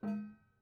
Prepared Piano

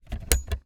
Click In, DVD Drive External; DVD Drives

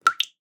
Drips

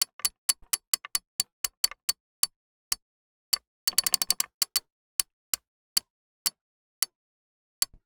Sailing

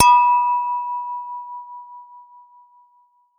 Glass Clink, Beer Glasses; Miscellaneous Beer Glasses

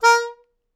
Melodica Short; Short Notes Chromatic

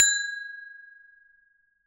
Hole Saw; Hole Saw Bits

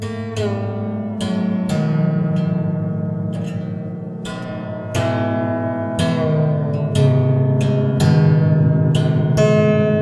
Detune, Piano; Piano Destruction

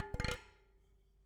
Bicycle Spokes

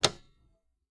Typewriter

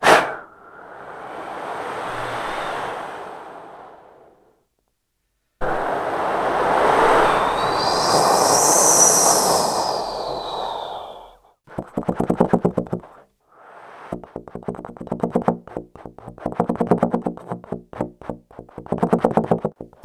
Blowing Etc, Trombone Extended Technique

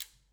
Back Pedal, Bicycle

